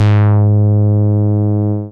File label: BAS MINI, MOOG; Sound & Vision - Gigapack II CD 1